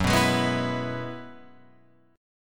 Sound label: F sharp 7th Suspended 2nd Suspended 4th, Guitar, Open F; strummed; 6,6,8,6,7,6